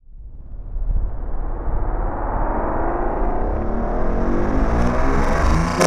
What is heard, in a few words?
Cinematic Metal Impacts; boom library